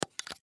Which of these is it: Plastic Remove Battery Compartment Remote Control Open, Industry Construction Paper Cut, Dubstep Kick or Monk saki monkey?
Plastic Remove Battery Compartment Remote Control Open